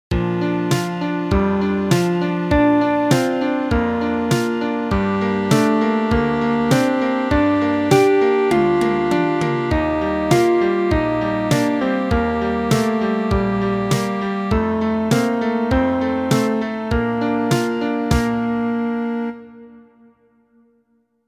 beats

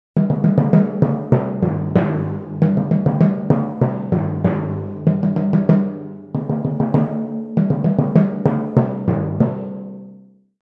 timpani

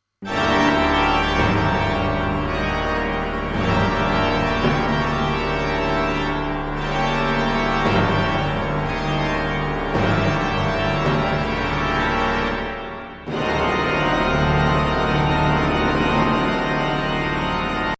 discord

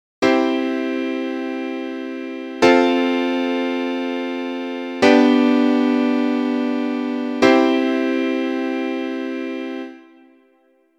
chord change

